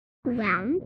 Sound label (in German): quak